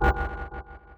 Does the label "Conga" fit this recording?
No